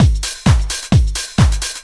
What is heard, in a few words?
groove, DRUMLOOPS